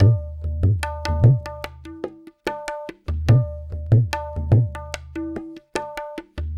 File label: Tabla